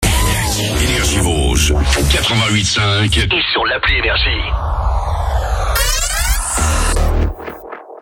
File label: JINGLE; VOSGES, 88.5 FM; NRJ